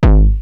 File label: Roland TR-909 BD; BD Selection